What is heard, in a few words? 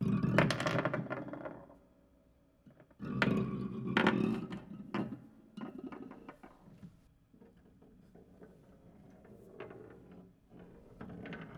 Marble Roll Rattle, Piano